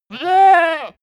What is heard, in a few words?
goat baa